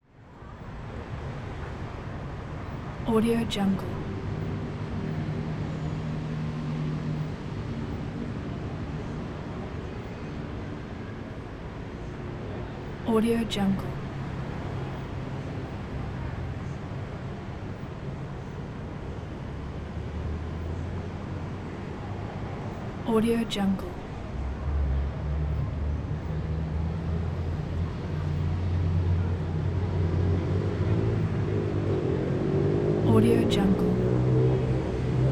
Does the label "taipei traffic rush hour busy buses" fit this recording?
Yes